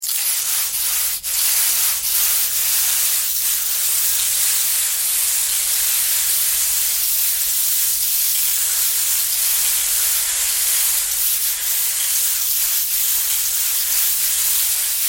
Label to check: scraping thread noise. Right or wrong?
Right